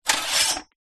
industrial tool metal file lift out of metal bucket containing other tools; zapsplat